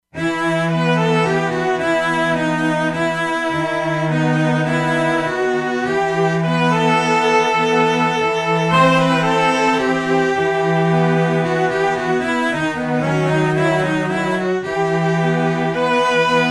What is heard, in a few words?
Solo Chamber, E-mu Proteus-2; Factory Presets